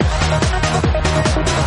double Up Techno Sound; jackpot 6000

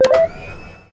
freespin Start; eggomatic

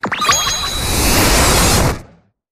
Bigwin Rampup; koi princess

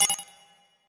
win Count Up End; eggomatic

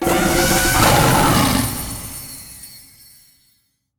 big win super mega win; serengeti kings

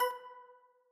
count Up End; elements

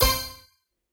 scatter short; spinsane

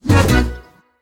sombrero win update; willyshotchillies

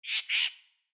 crow; the wolfs night

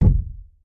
spin stop; gonzosgold

